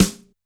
Snare H Hard, Standard Kit, Yamaha PSR-E323

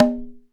CNG LO 1, Korg EMX-1, drum machines

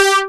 SEQUENCER, Techno Trance; Orbit Presets

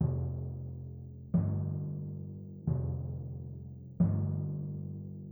Timpani, Orchestral; Sonic Foundry (Sony Creative Software); Orchestral VOL-2; Modern 25

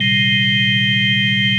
ORGAN, Drum & Bass, 160 BPM; Ueberschall; Drum & Bass Flash Trackz

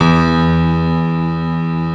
MIDI piano; East West; Global Sounds

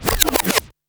REWIND

